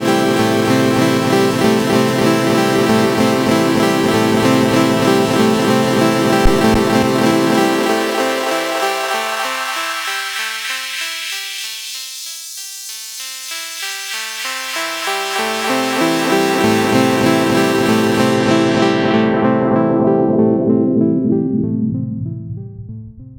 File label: Pad; Bastl Thyme